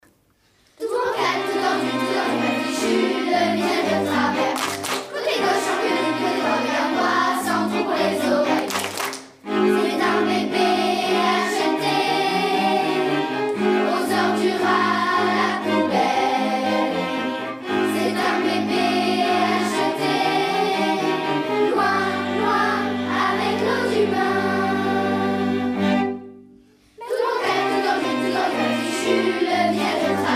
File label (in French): ensemble vocal variété jazz chant du monde